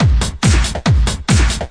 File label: 140 chugger, drum loops and breaks